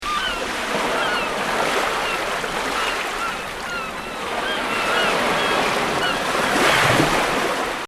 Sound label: sea loop